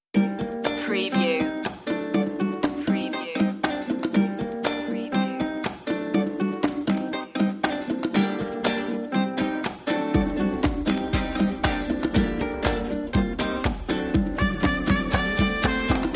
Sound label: cuban heat loop intro